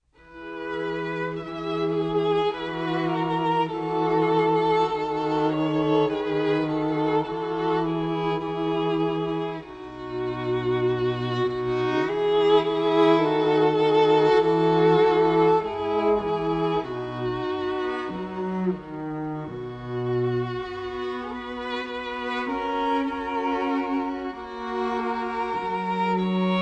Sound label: String Trio; Mozart Ave Verum Corpus